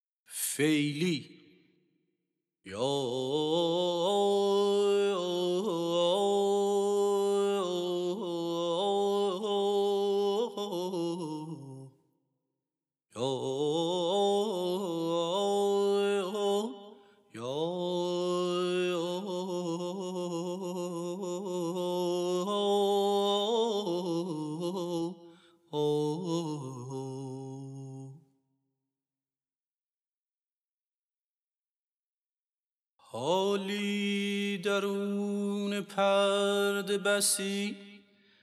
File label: Feyli Mahur Radif-e Karimi; Dastgâh Mahur